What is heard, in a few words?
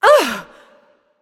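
surprised, female; smite; voicepack; hera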